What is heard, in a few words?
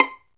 STR VL PIZ, Chamber Pizz, String Master Series; Roland - String Master Series